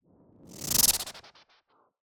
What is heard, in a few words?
whoosh sound design chatter buzz tight high fast decelerate; sonniss gdc